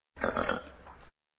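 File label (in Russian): храп лошади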